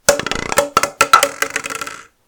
Plastic Bottle Drop

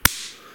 Slap

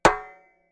Footsteps on metal

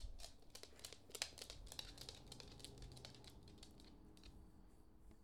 Dog Running Dog's Nails On Laminated Floor

